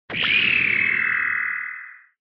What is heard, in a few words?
Retro Anime Beam Low Short